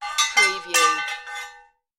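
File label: Sword Short Clash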